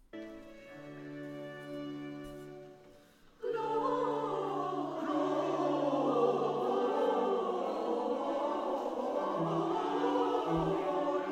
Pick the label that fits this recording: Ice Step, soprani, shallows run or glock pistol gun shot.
soprani